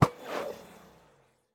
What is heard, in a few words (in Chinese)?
末影之眼发射声